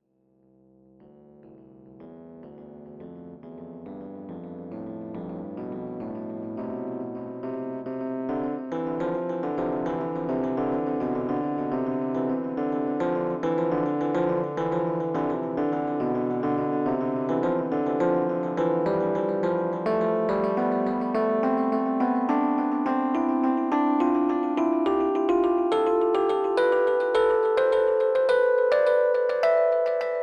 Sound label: piano